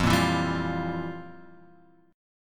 F Major 7th Suspended 2nd Suspended 4th, F, guitar; FM7sus2sus4 Chord {1 1 2 0 X 1} strummed